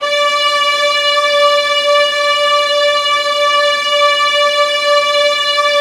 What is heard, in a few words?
VIOLINS L, Synclavier Strings; ILIO